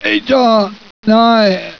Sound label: die